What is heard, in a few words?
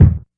kick, Classic Hip Hop